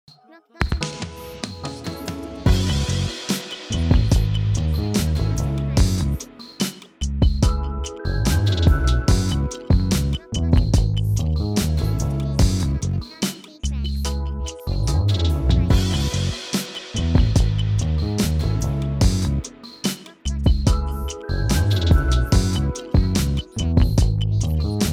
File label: BEAT